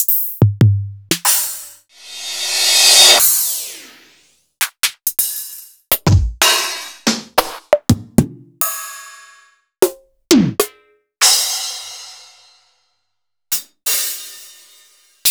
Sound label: Akai MPC2000